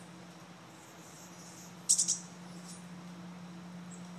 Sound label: Chestnut backed Chickadee